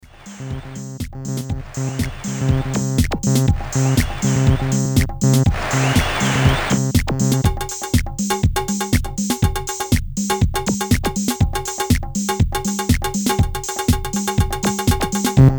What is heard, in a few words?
Drum Solo